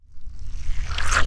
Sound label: toothpaste